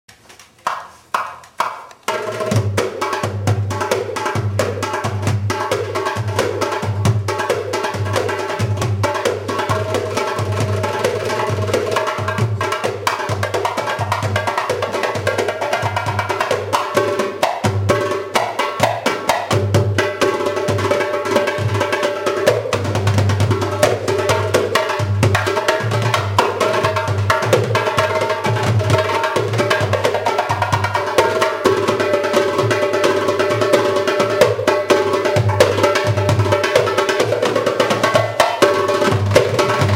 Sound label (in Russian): Darbuka. Dumbek Primer zvuchaniya